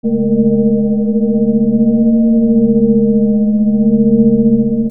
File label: AMBIENCE